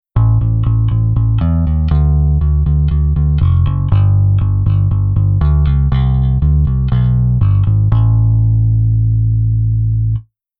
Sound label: Bass Velo Accent